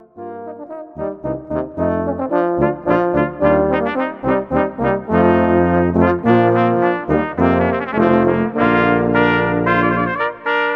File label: Hornpipe from Water Music, brass; Legend Brass